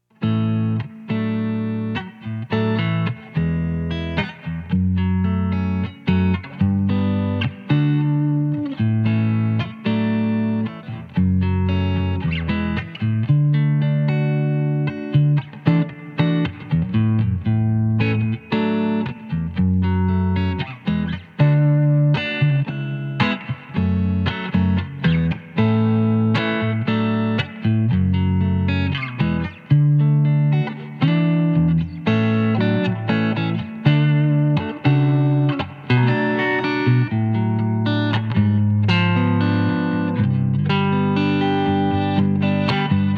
LP Fingerstyle Neck PU Light Grind Reverb

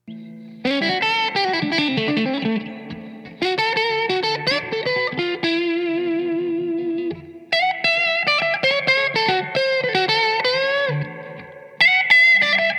Strat Blues 2nd Pos Neck PU Light Grind Reverb

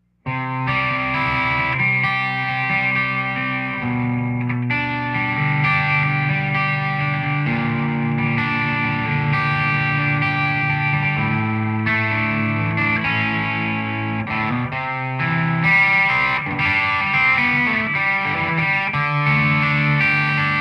Telecaster Chime test Neck and Bridge PU

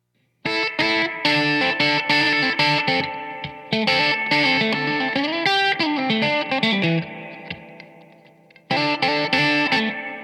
Strat Blues 4th Pos Light Grind Reverb

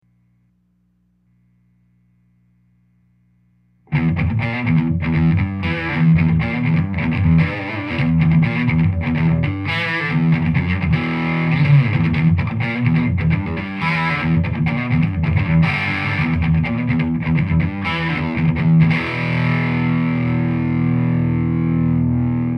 Telecaster Rock Neck PU High Grind

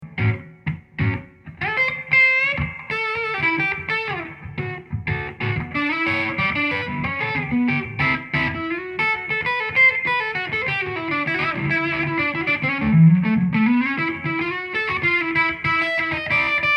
Les Paul Blues Shuffle Neck PU Light Grind

